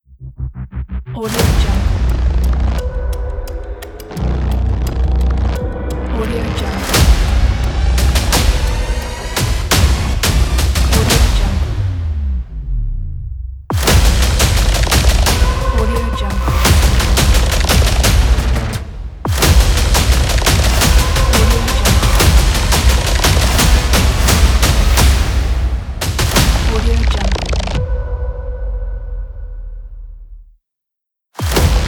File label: action trailer intro ident